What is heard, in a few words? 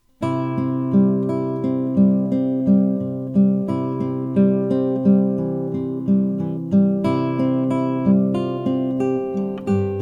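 delgado hybrid crossover brazilian rosewood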